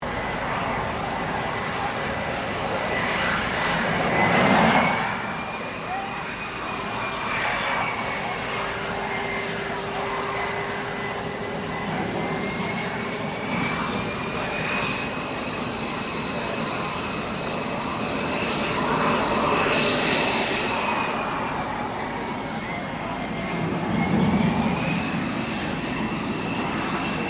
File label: AIRCRAFT FA-18 HORNET TAXI PA; RAAF FA-18 Hornet; Airplanes (Sound Effects:Vehicles)